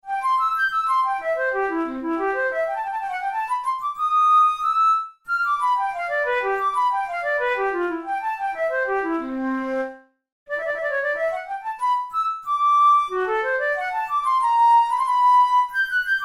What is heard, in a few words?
studies for the boehm flute; drouet 72